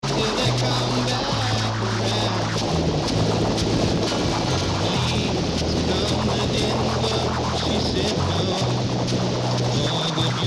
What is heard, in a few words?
1600kHz; ATS 405